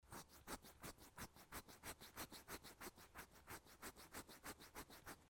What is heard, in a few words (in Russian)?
zvuk chesatsja